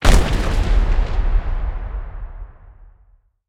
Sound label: ground explosion layer; War Thunder